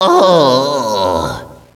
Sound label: ohhhhhhhh, echo inside caves, underground; DS; ML BOWSERS INSIDE; Luigi